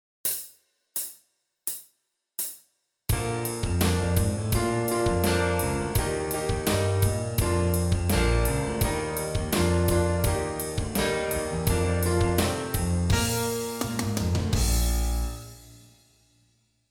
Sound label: Rhythm Prep